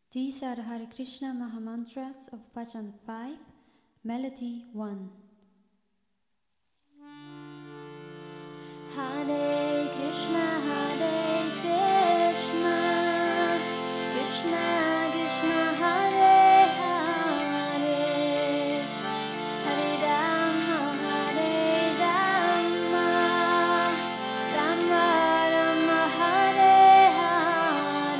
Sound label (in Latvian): hare krishna melodija, easy harmonium; sankirtan; badzan